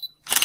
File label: camera shutter